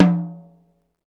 TOM HI, Roland; Club-50 - Foundations Roland; Naturals A

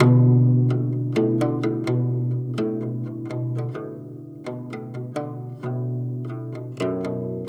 Guzheng Poly-Rhythm; Asia; Continental Drift Aftershocks

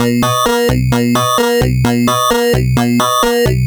Sh101 Loop, 130BPM; musicradar; bitcrushed; Crushed Loops

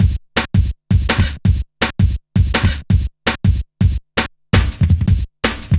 Drum Loops, Jungle

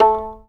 fiddle pizz A3; Sampleheads; Whole Lotta Country 1 & 2

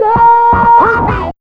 FX, Big Beat Drumloops

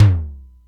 SDS9 LT, Simmons SDS-9; SDS9 Factory A4

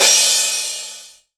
crash, Drum Machines, Korg ER-1; Kit 7

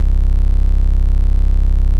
bass, Dance Mega Hip Hop Electro; EastWest

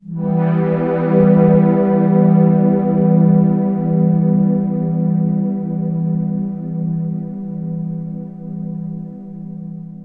PAD L; Best Service Advanced Media Trax Building Blocks; SIERRA

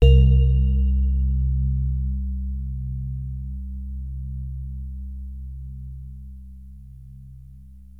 Access Virus B; Synthworx

